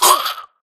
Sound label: grunt death neck; Titanfall 2